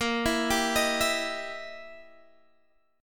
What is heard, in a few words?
E Minor Major 7th Flat 5th A sharp